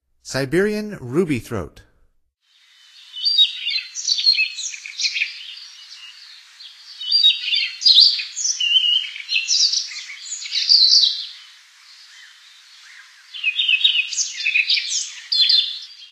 Siberian Rubythroat, Songs of Birds of North America; Stokes